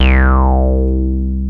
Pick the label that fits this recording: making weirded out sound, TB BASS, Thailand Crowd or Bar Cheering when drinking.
TB BASS